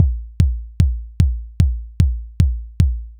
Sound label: Anabeat Kick